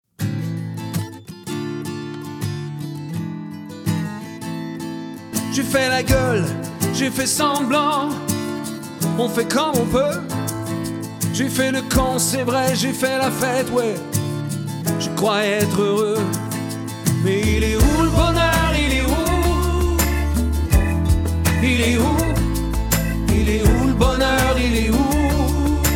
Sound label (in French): CHANT CONTEMPORAIN